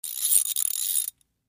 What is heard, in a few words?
Fishing Reel Reels In, fishing pole